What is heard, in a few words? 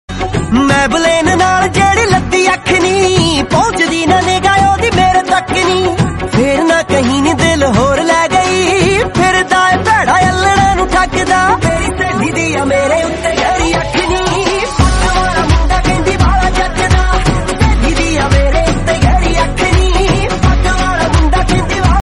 punjabis ringtone